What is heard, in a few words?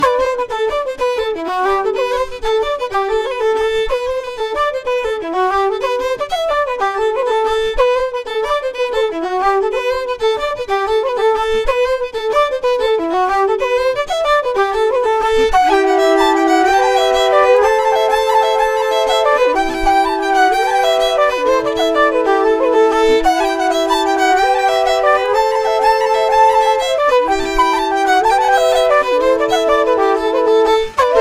Jig Flute Backing; Kilkenny; In Context; FINALE JIGS